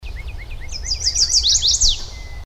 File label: Chestnut-sided Warbler; Garrett